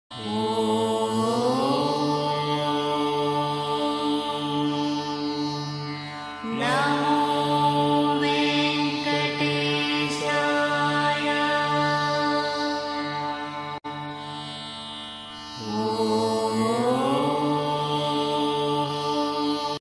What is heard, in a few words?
om namo venkatesaya